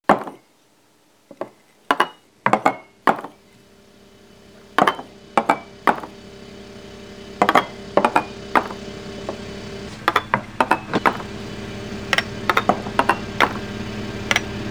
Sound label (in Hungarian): 5 hangos etud